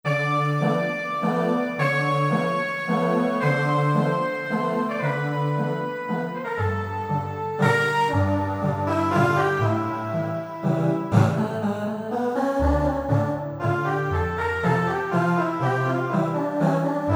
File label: Aah Decy Pad